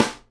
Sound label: SN GLASS, Live Snares; E-MU Producer Series Vol. 8 – Platinum Phatt (CD 1)